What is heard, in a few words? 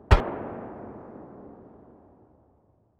ash12 muzzle mono far, weapons; STALKER; IWP